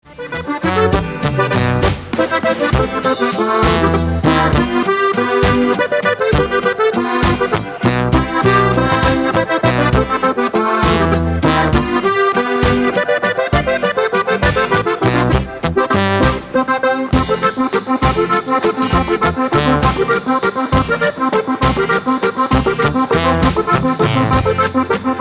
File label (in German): tirolerisch gspielt